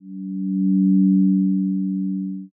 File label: zero g